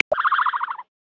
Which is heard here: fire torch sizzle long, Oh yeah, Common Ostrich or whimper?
Common Ostrich